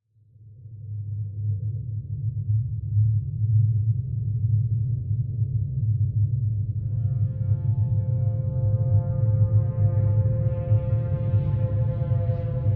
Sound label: scary ambience